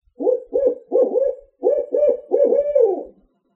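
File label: Who Cooks for You, Barred Owl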